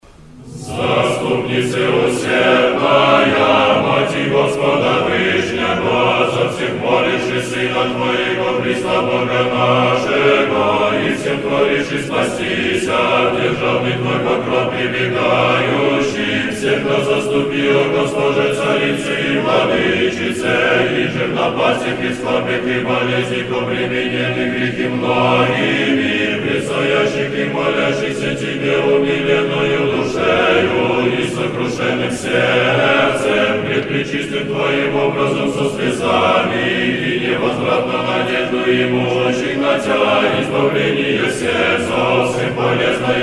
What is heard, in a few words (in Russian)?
Хор, Тропарь; Казанской иконы Божией Матери; prazdnovanie v chest kazanskoj ikony bozhiej materi 2025